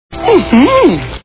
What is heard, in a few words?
mmmhmmm